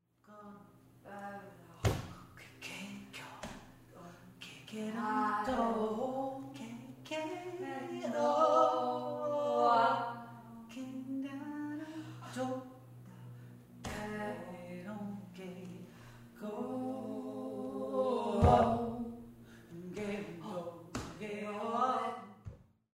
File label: stones and pebbles